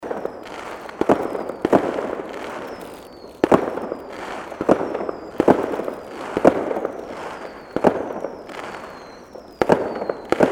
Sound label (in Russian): zvuk fejerverka